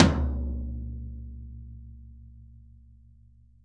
Interactive Drum Kits; Sonic Reality

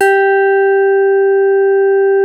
HARD G4, synth pluck

